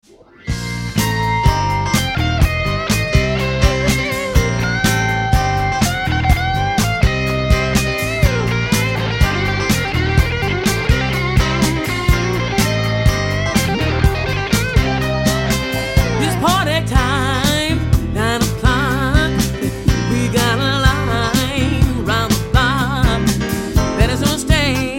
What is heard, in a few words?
Blues Fonk